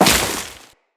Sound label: Crewman Step Veg, Footsteps; Enemies; Corpus